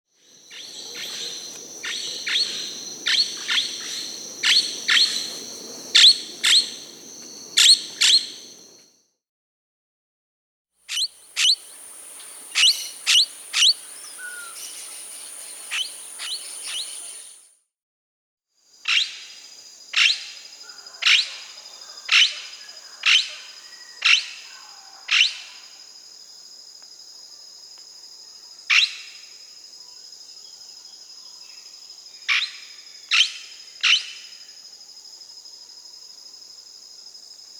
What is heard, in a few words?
Blue-headed Parrot (Pionus menstruus), Brazil, Peru; Birds of the Amazon; S. America